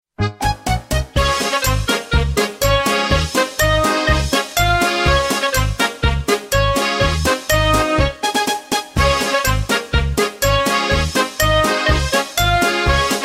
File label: POLKA; Jewish Music